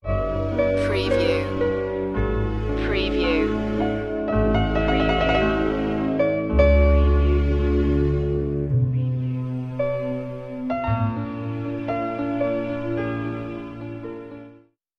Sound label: orchestral romance